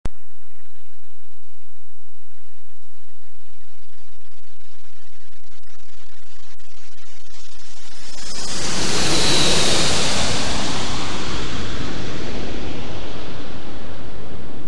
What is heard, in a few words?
Aeroplane Surround